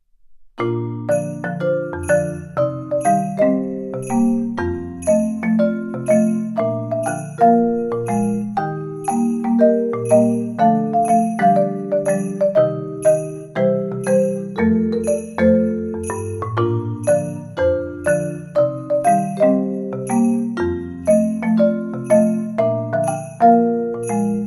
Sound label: jingle; K-herb